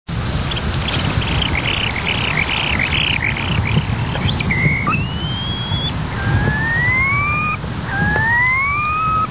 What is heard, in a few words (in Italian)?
piovanello